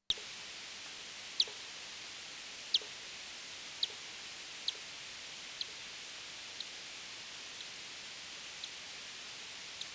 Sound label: emerging from roost, bat